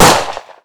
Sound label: pistol shot; casualtiesunknown